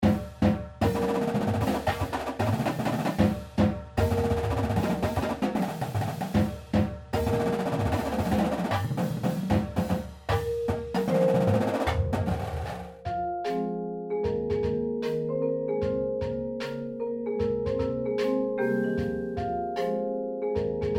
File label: PERC